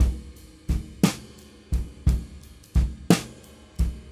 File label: Brushes SN RC